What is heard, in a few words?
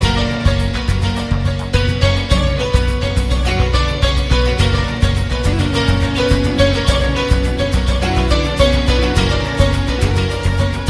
Blend Of Piano